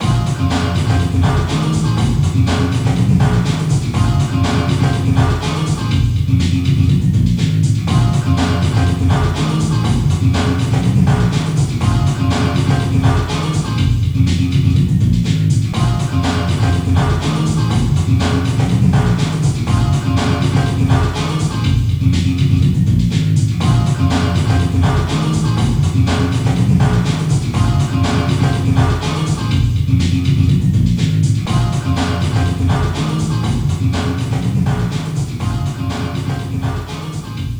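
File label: background music store; yakuza ryu ga gotoku kiwami 2 pc gamerip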